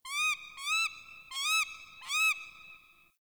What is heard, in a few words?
Tree Goshawk; Warframe; Ambience; Nineteen Ninety Nine